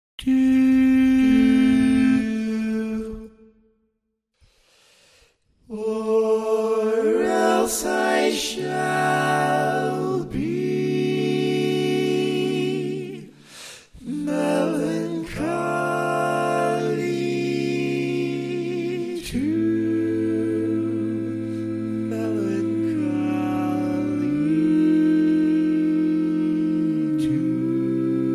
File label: Tenor, barbershop; Arranger / Rea